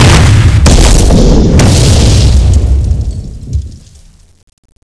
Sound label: jump end; cstrike; Frozen Terror